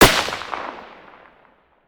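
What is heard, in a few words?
weapon ar2 suppressed fire player atmo exterior; gmod; viper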